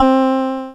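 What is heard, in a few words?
Casio MT-45 Electric Piano C4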